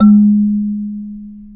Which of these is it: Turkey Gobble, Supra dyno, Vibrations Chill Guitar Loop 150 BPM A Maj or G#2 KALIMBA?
G#2 KALIMBA